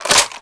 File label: Reload Open; Valorant; shorty